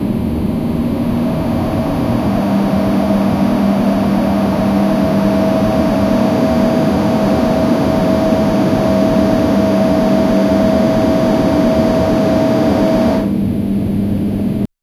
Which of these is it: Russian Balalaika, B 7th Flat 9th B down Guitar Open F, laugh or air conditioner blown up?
air conditioner blown up